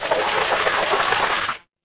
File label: water stream